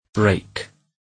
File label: brake